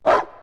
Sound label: bark; Smite; Cerberus